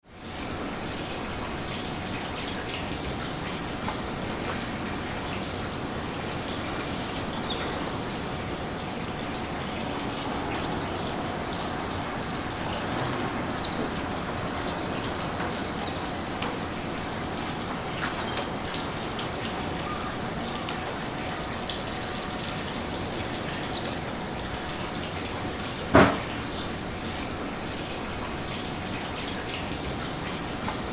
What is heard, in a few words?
waterway